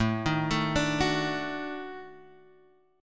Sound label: Eb Minor Major 7th Flat 5th